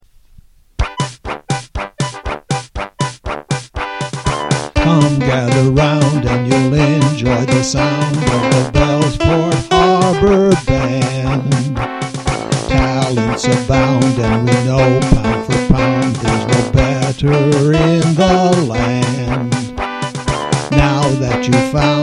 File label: bellsport band